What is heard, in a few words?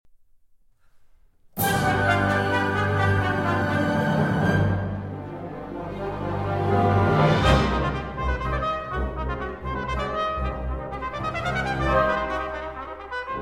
Trumpet Concerto in C Minor, Allegro, Scherzando; Trumpet Concerto No. 1 in C Minor